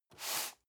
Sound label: cardboard box medium slide short; objekte